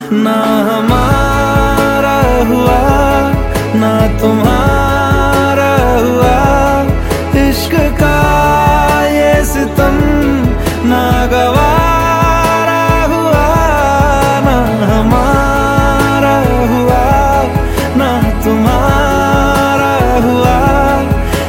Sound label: Ringtone